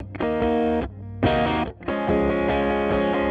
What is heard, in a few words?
Fathead near, Electric Guitar; Listening Test-5: Electric Guitar